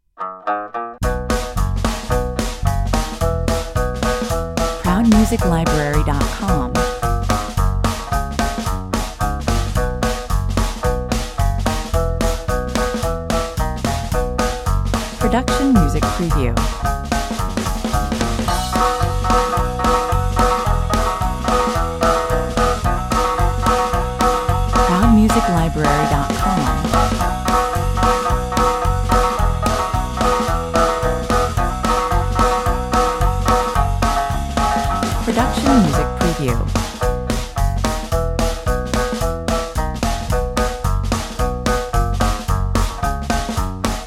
country hoedown 110 bpm

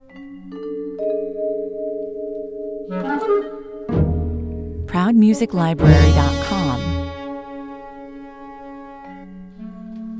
retro caper 64 bpm

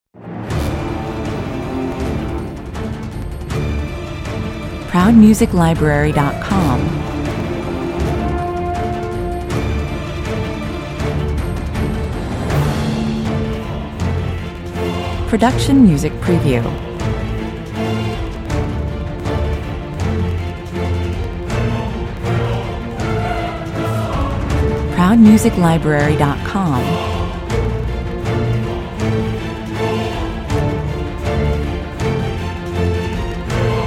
dark angels (with choir no solo voice)

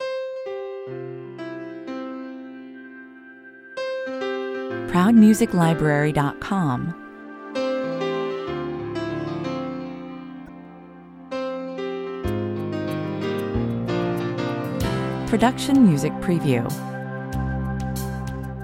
corporate

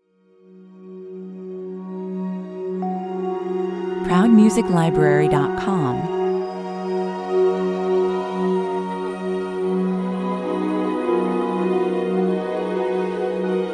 wake up beautiful (no piano) 121 bpm

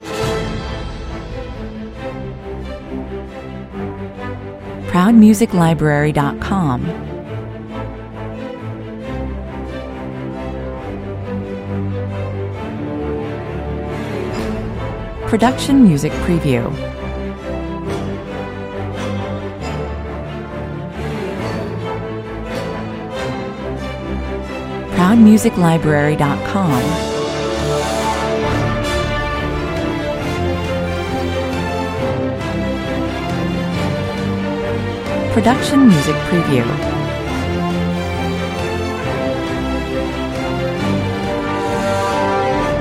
no choir no percussion

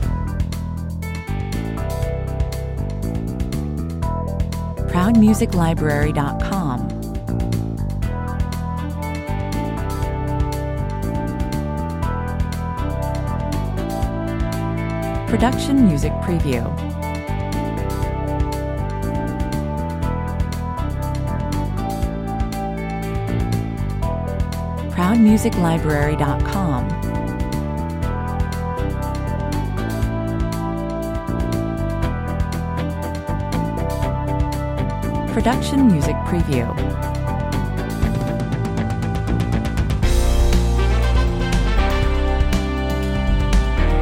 brass transit 120 bpm

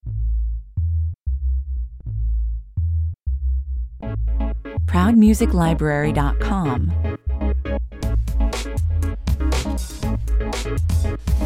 groove 120 bpm

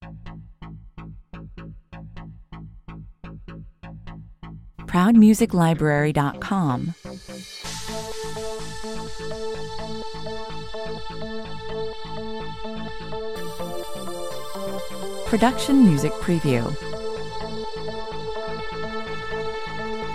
electro metermaiden 126 bpm

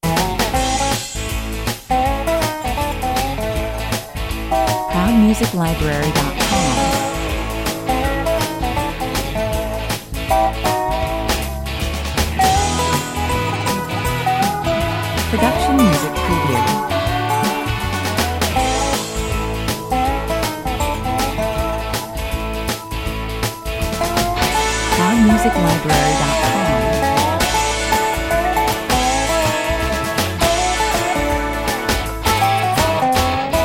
dobro shuffle, 133 bpm

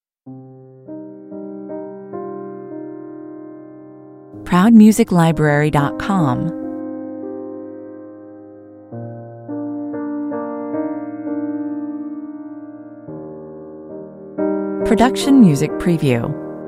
(piano only)

